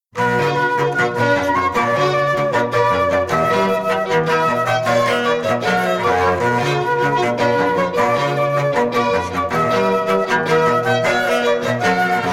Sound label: Jewish Traditional, Classical Trio